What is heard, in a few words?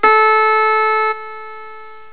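HARPSICHORD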